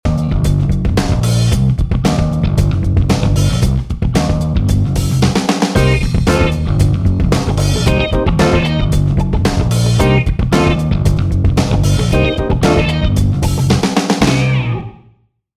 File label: Party Funk